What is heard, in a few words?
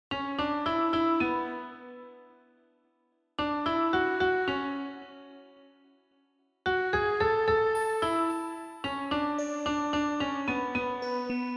Karaoke; hiva fiefia